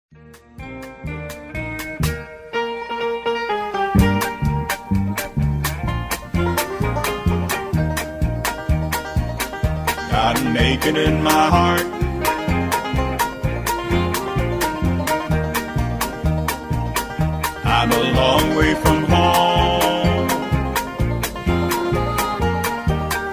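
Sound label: Instrumental with background vocals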